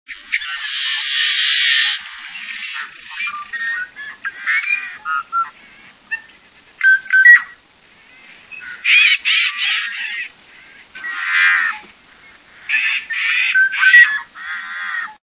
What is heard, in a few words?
Yellow headed Blackbird